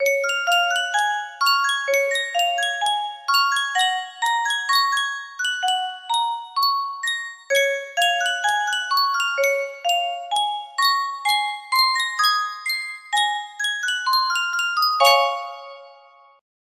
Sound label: yunsheng custom tune music box